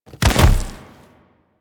Forest Night Bodyfall; Cinematics; Warframe; Sacrifice; Ballas

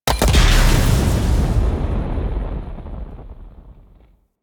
Obelisk Activate; Warframe; Infested Eris

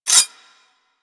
Whip Mech Rotate; Grineer

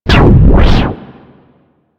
Ability Cast; Warframe; Cast Start

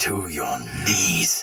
Attack Heavy Soldier Sword Agent Male; Sinecure

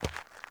Walk Gravel, Footsteps; Characters; Enemy; Mockeries

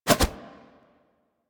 Hand Shotgun Reload Flip, Weapons

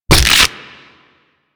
Brawl Knee Kick B; Warframe; Brawling

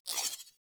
UI Dialog Open; Corpus Theme; Warframe

